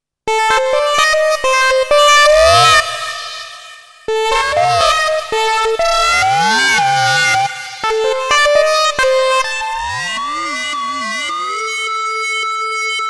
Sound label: PC3-A8 Tesla Coil